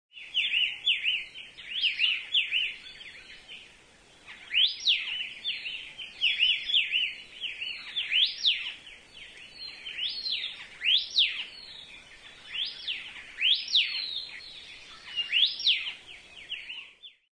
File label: kauai elepaio